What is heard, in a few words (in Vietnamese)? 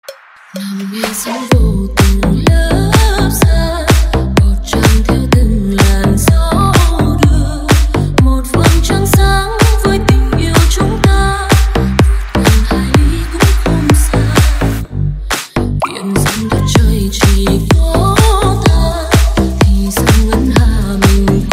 remix